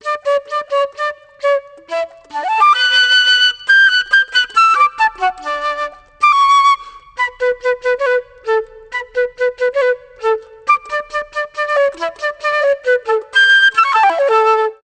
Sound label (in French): Flute traversiere